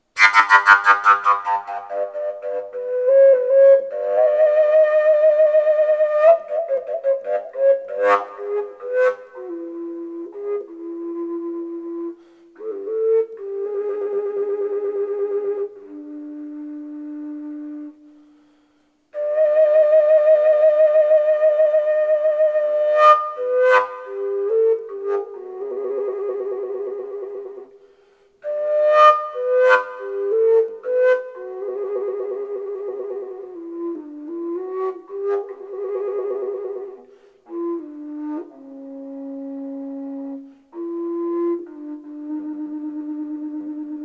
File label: elder decorated, natural fujara